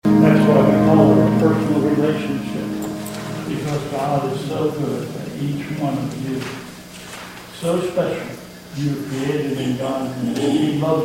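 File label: Closing Comments and Chorus, church service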